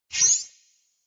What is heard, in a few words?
Feather; moonstone madness